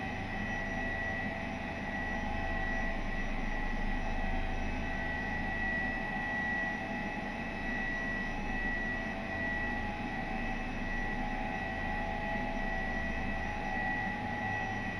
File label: H145 Flight In left, Headset; ec145